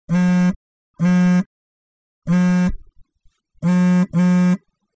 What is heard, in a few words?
buzz; aprilfools